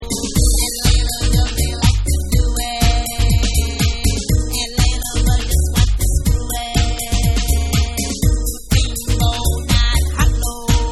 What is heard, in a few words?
Organ Vocal Mix